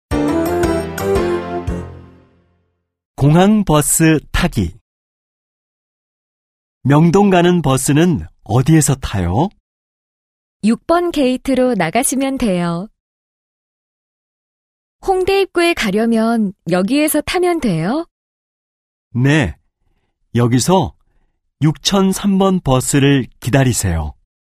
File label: K-POP